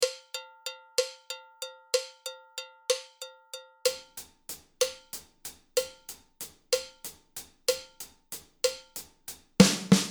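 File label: Call Response 94bpm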